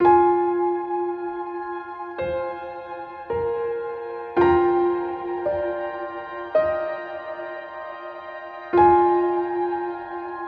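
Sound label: tension cinematic; By Burak Sound Bank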